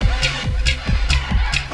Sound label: percussion loops, Techno; PS-45 WAV Techno ID